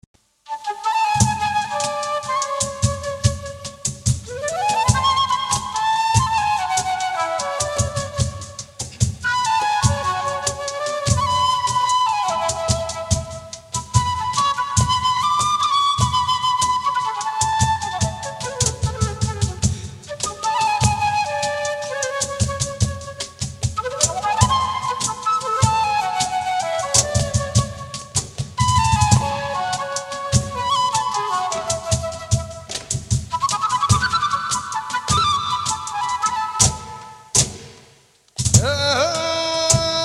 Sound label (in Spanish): guajira intro